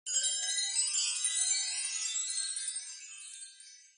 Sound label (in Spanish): toca Jackpot